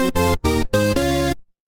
retro game game over

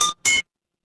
feedback success

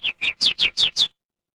animal bird

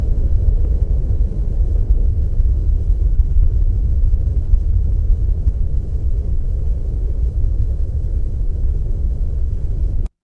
ambience wind

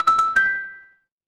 ui alert

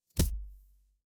feedback start

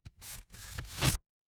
paper fabric tear

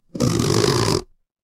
cartoon stretch

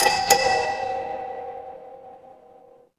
door doorbell

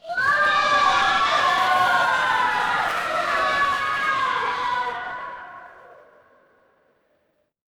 crowd cheer

